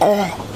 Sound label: pufferfish augh; torchlight